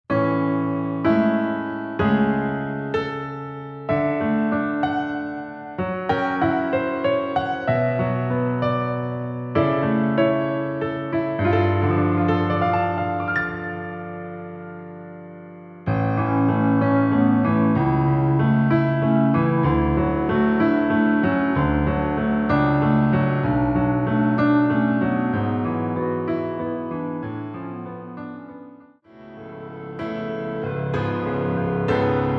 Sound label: piano